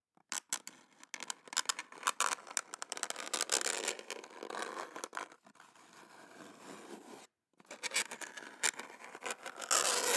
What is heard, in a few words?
Nails On A Chalkboard